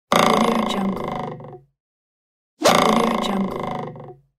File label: vibrating wood sfx